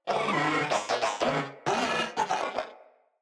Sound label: Moa Vocal Idle